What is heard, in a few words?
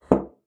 BASSOON; Xsample VOL-6